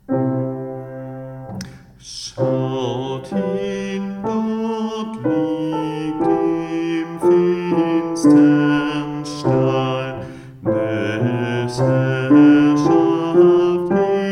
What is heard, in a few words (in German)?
Schaut hin Bass